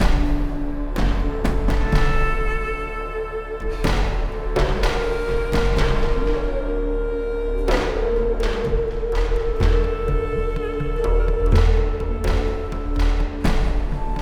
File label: Battle Light Loop; The Golden Compass; Samoyed